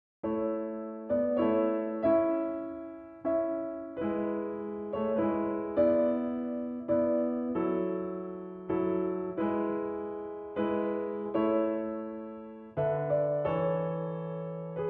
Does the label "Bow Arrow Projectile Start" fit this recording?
No